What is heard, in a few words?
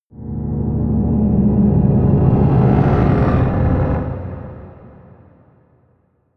scrapes scary dark, drones